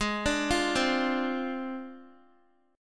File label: A flat 6th Flat 5th A flat Guitar, chord; arpeggiated; x,11,12,10,12,x; JGuitar